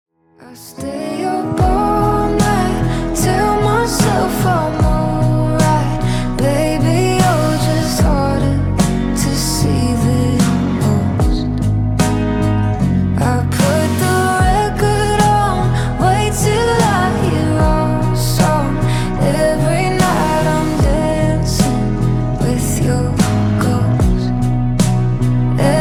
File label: slow grooves